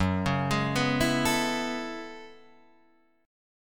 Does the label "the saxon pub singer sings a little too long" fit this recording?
No